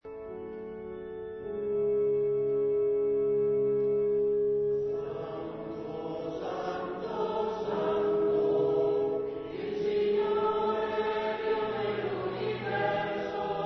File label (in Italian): Santo Gregoriano; schola